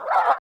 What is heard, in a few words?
ROAR, Perc Toys; Spectrasonics; Supreme Beats; World Dance